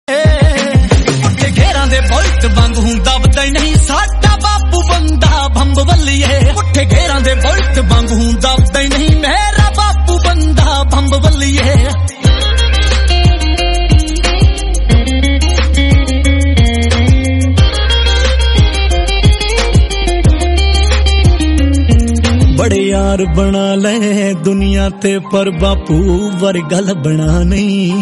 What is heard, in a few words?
Punjabi